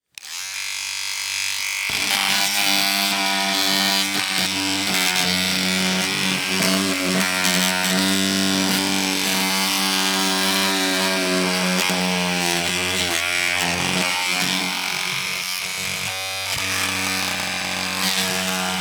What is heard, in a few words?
Electronic Trimmer Buzz On Plastic Beard Trimmer; AdobeCC